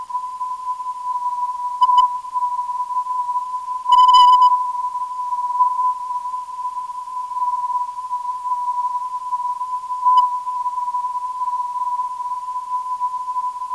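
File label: non-directional beacon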